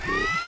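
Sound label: turret gun power up; allegiance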